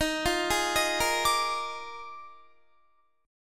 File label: Eb Major 7th Suspended 2nd Suspended 4th Eb Guitar 4F 4A 5C 5G 5C; EbM7sus2sus4; arpeggiated